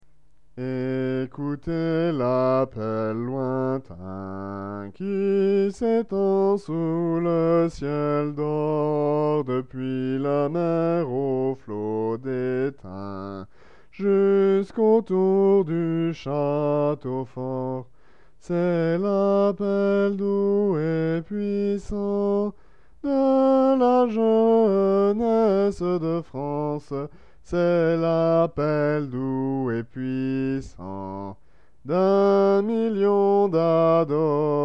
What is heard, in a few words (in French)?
Voix des petits